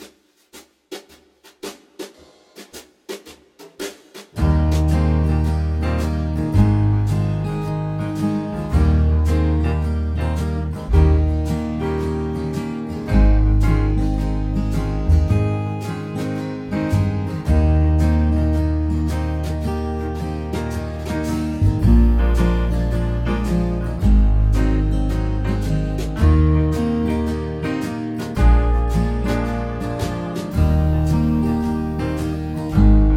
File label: Eb warmup slow blues, tenor horn